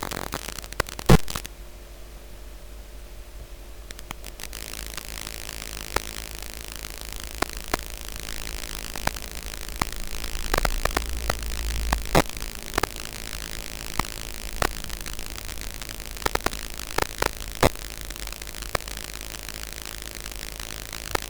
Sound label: noise